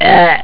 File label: choke, human